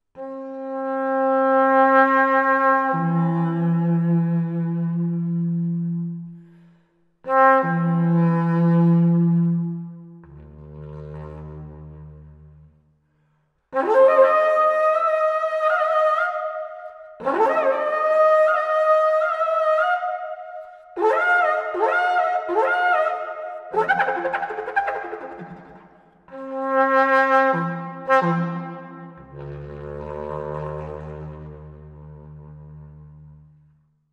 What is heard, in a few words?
Sound War Trumpets